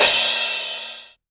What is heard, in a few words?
crash, Korg Electribe ER-1; Kit 8